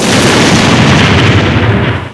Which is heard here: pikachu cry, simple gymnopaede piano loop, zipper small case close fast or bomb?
bomb